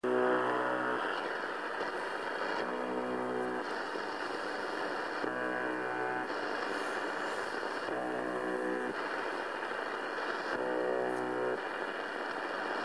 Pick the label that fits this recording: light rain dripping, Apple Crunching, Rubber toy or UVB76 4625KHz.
UVB76 4625KHz